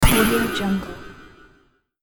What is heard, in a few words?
magic maker